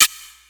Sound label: perc, Percussion; Trance Explosion Vol1